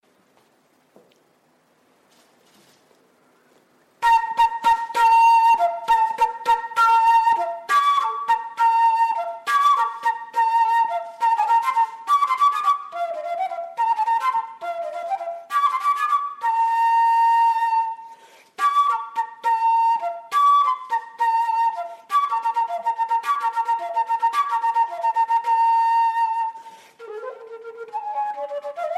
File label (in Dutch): fluit; dwarsfluit; mono